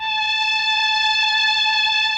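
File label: MELLOSTR, Mellotron Violins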